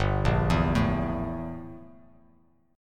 G Minor 6th Add 9th G down Bass Standard; Gm6add9 arpeggiated; JGuitar

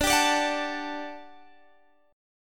G Major 7th Suspended 2nd D

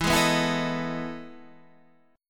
E Major 11th down Guitar Standard; EM11 strummed; JGuitar

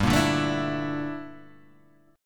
G Minor 6th Add 9th G; Gm6add9 Chord {3 1 0 2 3 0} strummed

